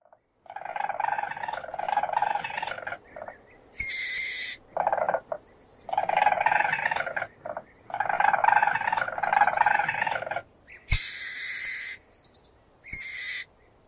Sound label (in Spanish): SONIDO DE COMADREJA